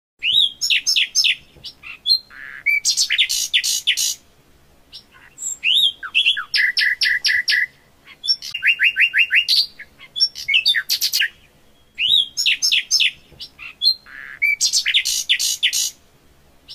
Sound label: Nightingale Sings; Ringtone